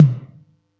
Yamaha EX-5

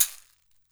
CAXIXI, Brazil Drum Perc; E-MU Producer Series Vol. 7 – Old World Instruments

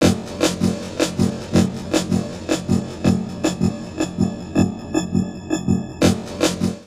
FLUID 160, Drum Loops Crazy Processed; USB Soundscan vol.07; AKAI

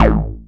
OB VOX A2; Hollywood Edge - Giorgio Moroder Rare Synthesizer Collection; OB 4 VOX.

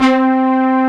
Synth Brass C3; Digital Sound Factory Xtreme Lead

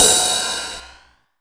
HH OP; HH OPEN; AKAI; USB Soundscan vol.20 - Fresh Disco House I

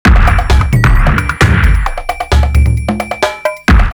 R8 132, drumloops, DrumMachines; X-Static Goldmine; 90 s Sample CDs

